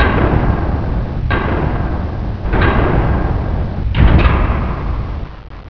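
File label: door library stairs down; Inside Mac Games; Myst Demo; Myst Preview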